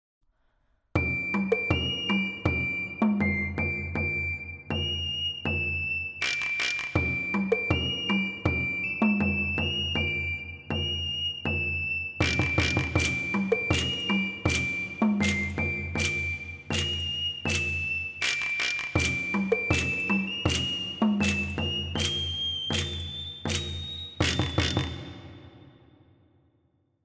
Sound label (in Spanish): la ocarina; mesoamerica